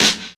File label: airy sizzle snare g sharp key; Duration 0:01; Mono; snare drum one shots